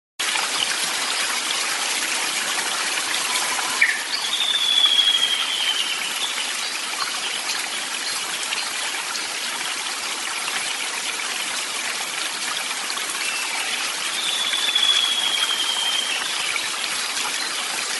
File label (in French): pluie; Etude détaillée des acouphènes